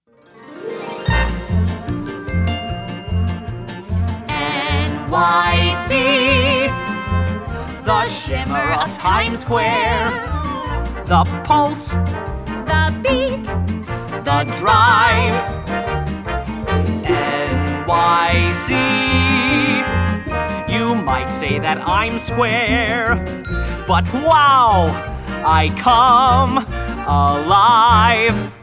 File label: Vocals